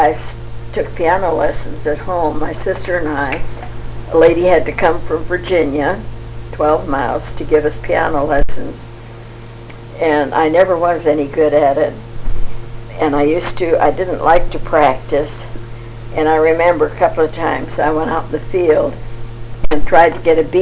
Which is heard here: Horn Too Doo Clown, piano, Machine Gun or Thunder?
piano